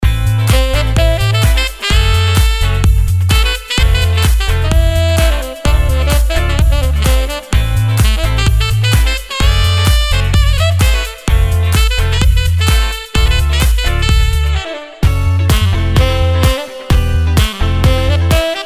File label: Smooth Sax Riffs